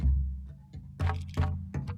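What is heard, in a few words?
Calm Far, music; from dust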